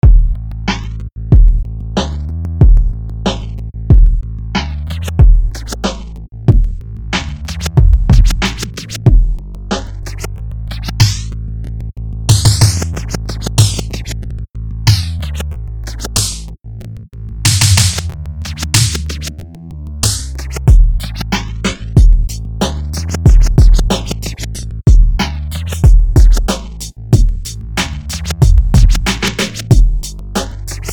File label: beat